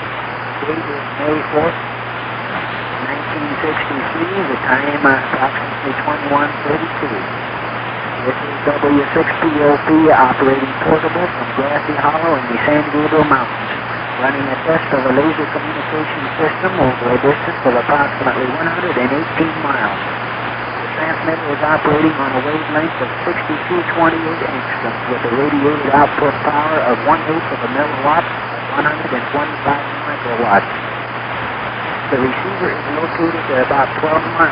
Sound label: laser transmission cycle